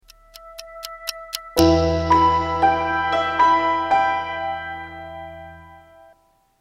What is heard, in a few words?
Countdown logo; Planet Earth